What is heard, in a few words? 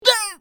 Pig hit; bad piggies; android; mobile; windows